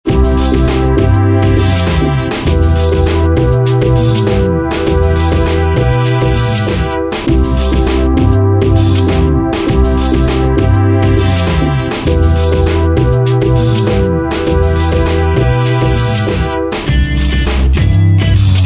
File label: Groove; bg-music